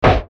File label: Hammer